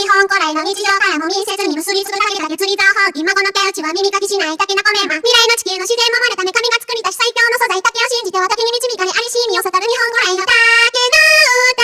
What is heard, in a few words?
Vo Main